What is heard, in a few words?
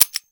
Dryfire, Magnum; zps; Weapons; Firearms